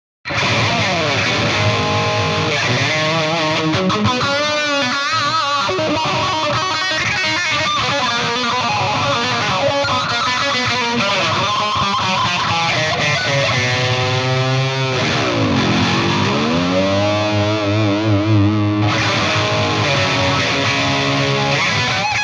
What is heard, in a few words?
ESP Bassman; Heritage